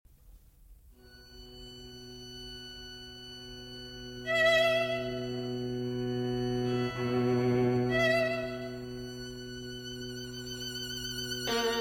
Quatuor Etudes Vibrato; Quatuor III; 9 Etudes

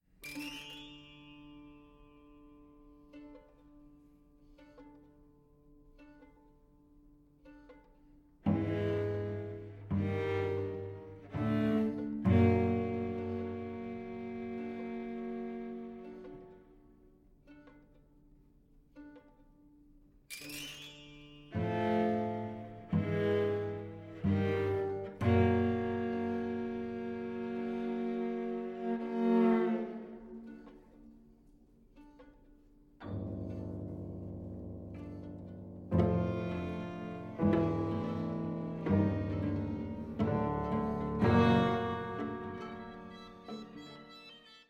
Variations For Oboe, Piano & Strings

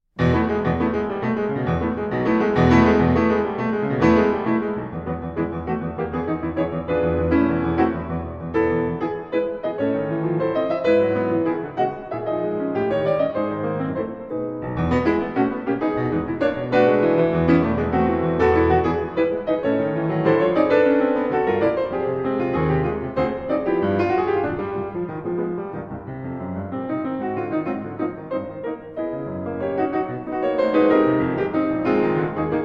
Piano Sonata in E Major Allegro Molto

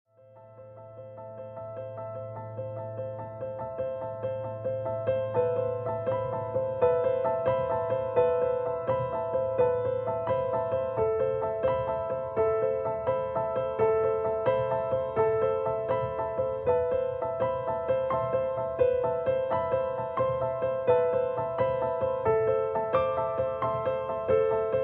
Solo Piano; Ravage

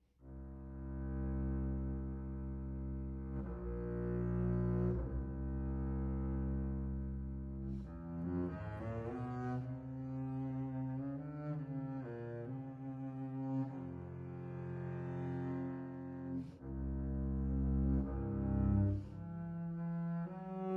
Double Bass and Piano

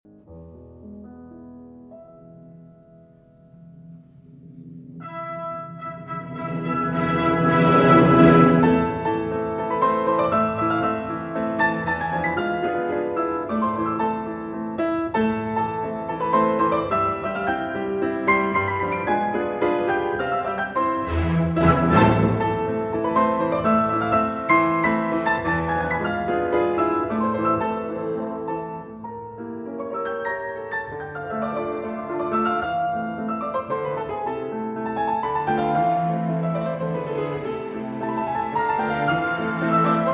Piano Concerto in A Minor, Allegro non troppo

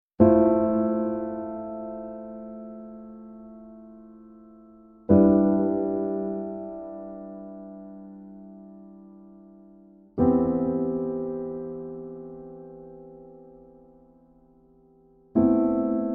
Moving Chords

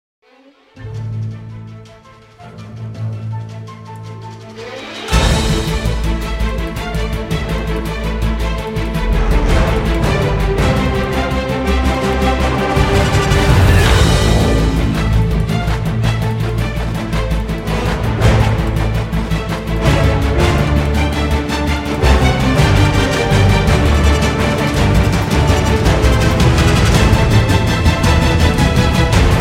Cannon in D Minor (Instrumental)

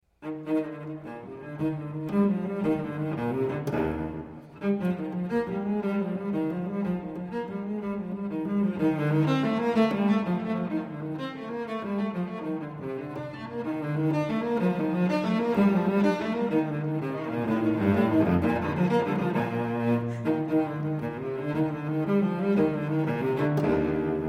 Unaccompanied Cello Suite in E-Flat Major, Gigue; No. 4; BWV 1010

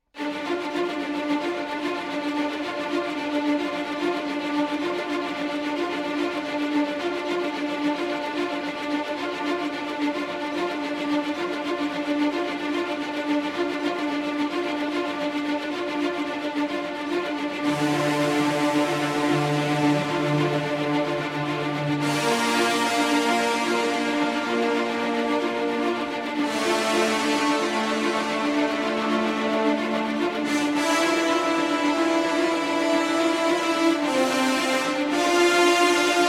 Orchestral

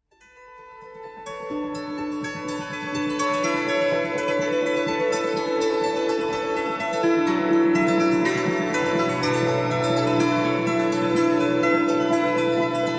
Koto

